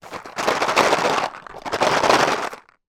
food chocolate in box open shake; maltesers; zapsplat

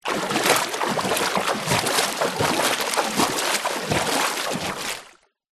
water movements swishes 1 metre deep; zapsplat

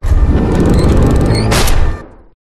DOOR BIG; PMSFX

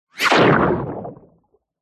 bullet impact water underwater pov; zapsplat

